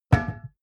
glass door small close

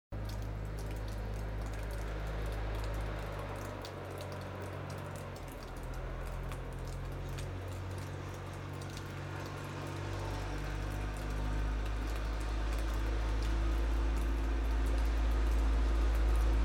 diesel truck snowplough

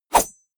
blade whoosh small

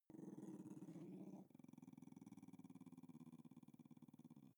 dog snarl long

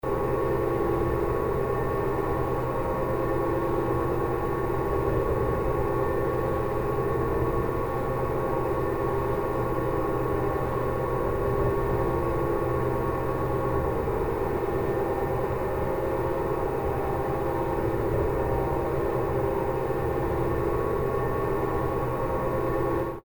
sub pulse transformer

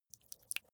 flesh wet greasy squish

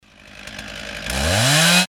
chainsaw

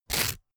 toast spread

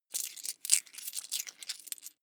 coins clatter heavy long

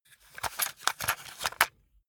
cassette rattle medium

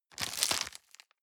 plastic bag small turn hard

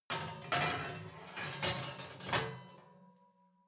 oven baking sheet draw in soft

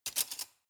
cutlery large drawer grab

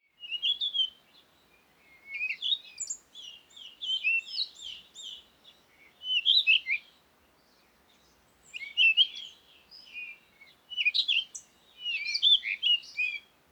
komati africa morning wind birds close

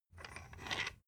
thread screw remove

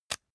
match light fail

